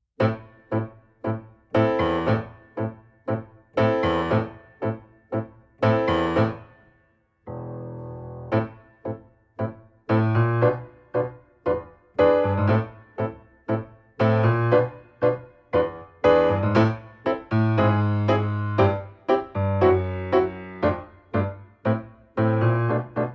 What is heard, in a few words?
piano playalong